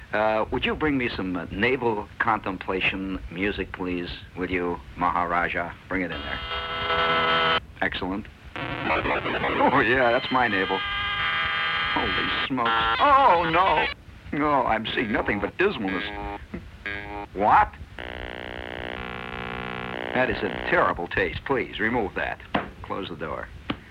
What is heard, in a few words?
Pitch Variations